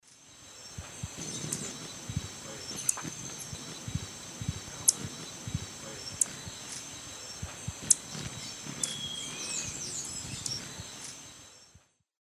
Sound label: Florisuga mellivora, male calls at feeder; S. America; Eastern Lowlands